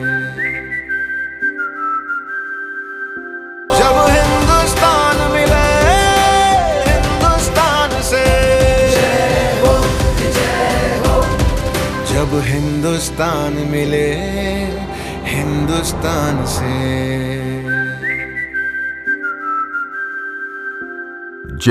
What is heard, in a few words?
Ringtone; JK Tyre